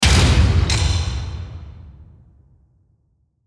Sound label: Hit Metallic Latch Reverb; Production Element Imaging Element Accent Transition